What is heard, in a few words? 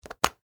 Household Hairspray Cap Remove; Bathroom Sound Effects; Bathroom (Sound Effects:Household)